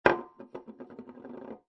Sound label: Foley, Aerosol Can Set Down On Table; Set Down On Table And Wobble